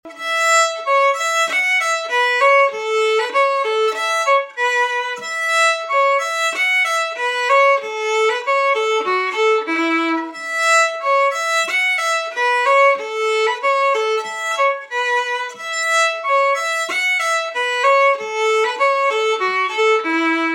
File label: Polka